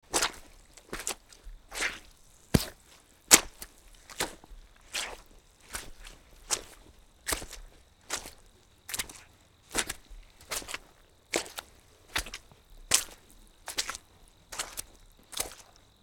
shlepki po grjazi

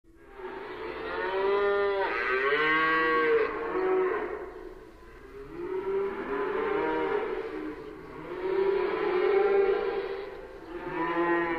Звук стада коров

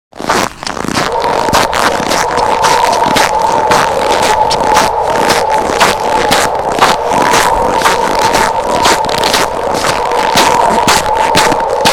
Hrust snega i v yuga

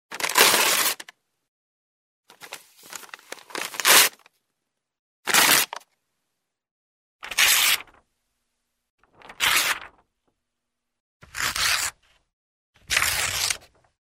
zvuk razryvanija bumagi

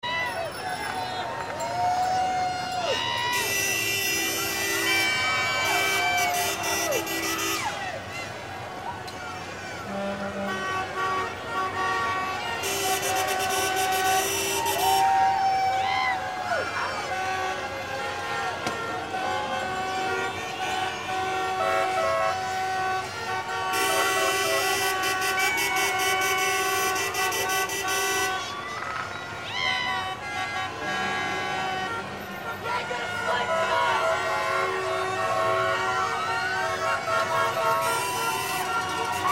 ulicy na prazdnik

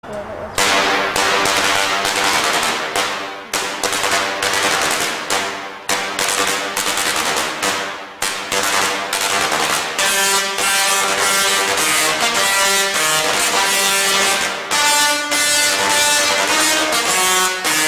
imperskij marsh katushka tesla

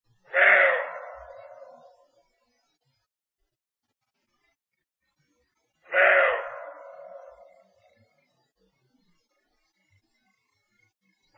krik kosuli

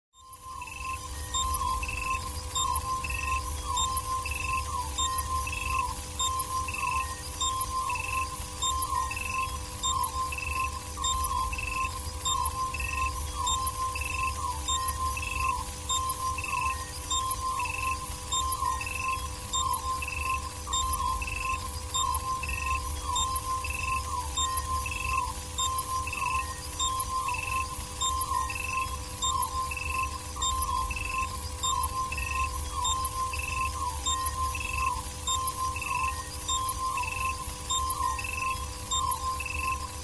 zvuki medicinskih priborov

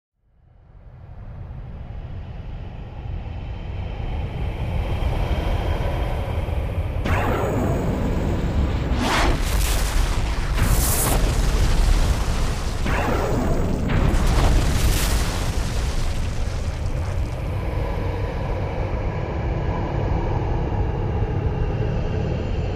vystrel lazerom

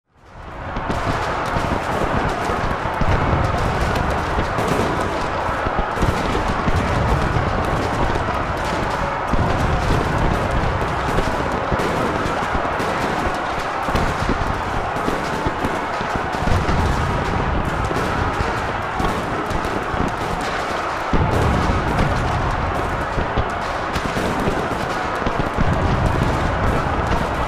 zvuki bitvy s perestrelkoj

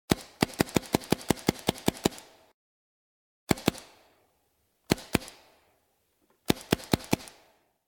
zvuk pejntbolnoj strelby

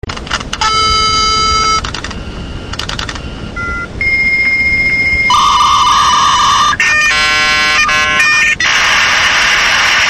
podkljuchenie k internetu

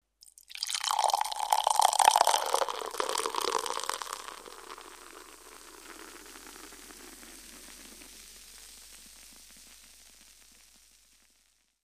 pivo nalivajut v stakan

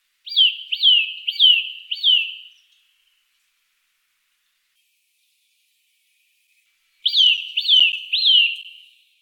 zvuk popolznja

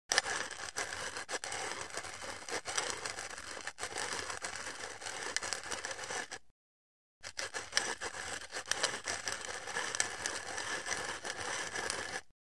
melnica specij

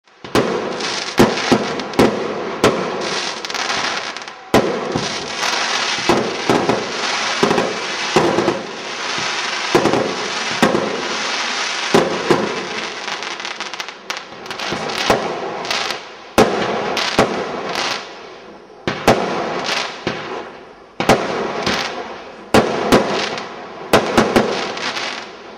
zvuk vzryvov saljuta

